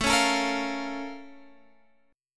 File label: A sharp Minor Major 7th